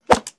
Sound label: Arrow Hit, Weapons & Explosions; Sony Pictures Sound Effects Series Volumes 1-10; Sony Creative Software